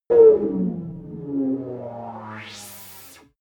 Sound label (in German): racing fighter engine stop, raumschiff